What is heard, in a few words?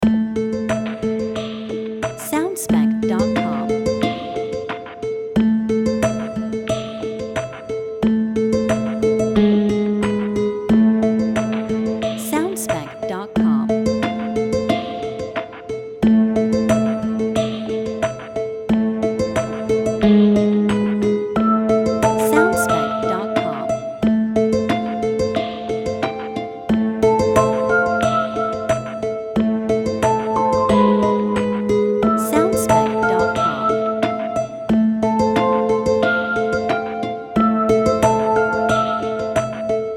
Mystical Triphop Lite Loop